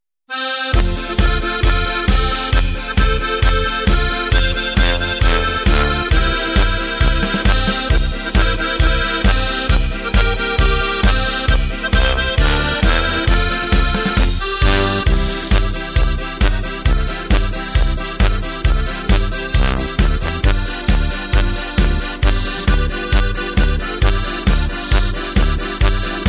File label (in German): Polka, Steirische Harmonika